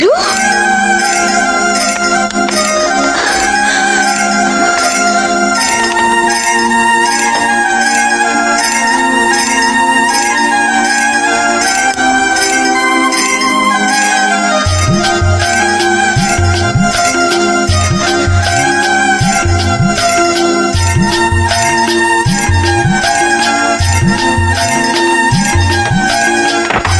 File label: most romantic tone